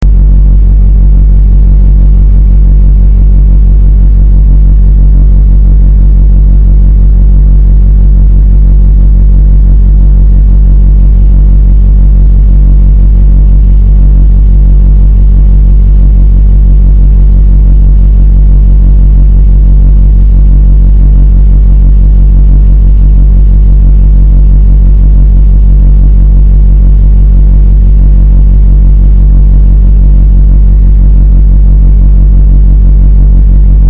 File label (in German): grundbeat